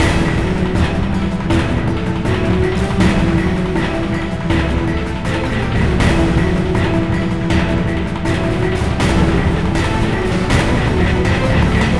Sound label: Boss Bkgd